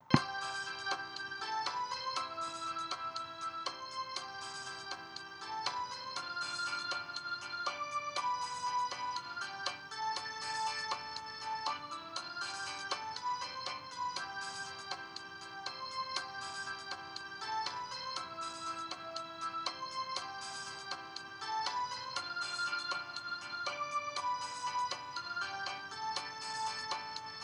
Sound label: rumba